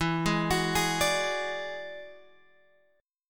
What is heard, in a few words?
E 9th E down Guitar Open G